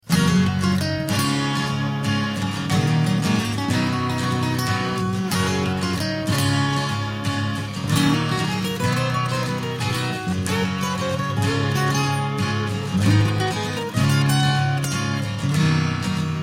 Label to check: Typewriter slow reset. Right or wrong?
Wrong